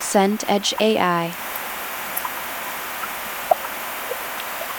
stream shallow rocks

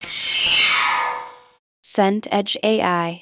scifi cloak engage

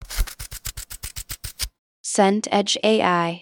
scissors cut paper

